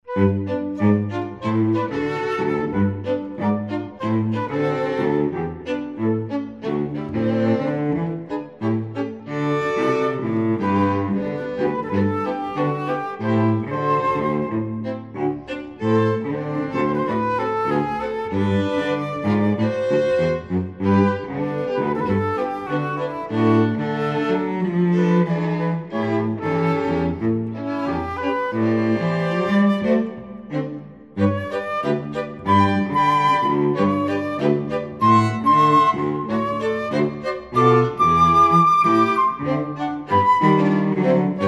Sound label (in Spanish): La conga sabrosa